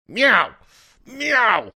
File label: meow meow